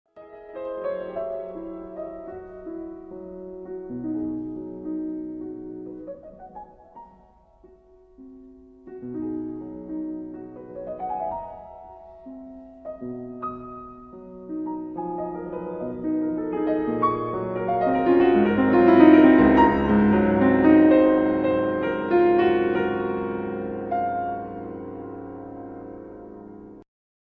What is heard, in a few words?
Scriabin